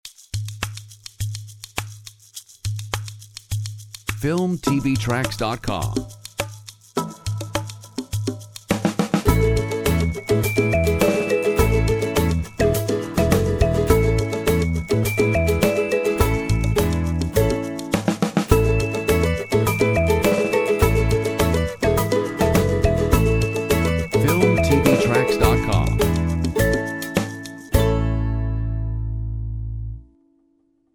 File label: Funny Ukulele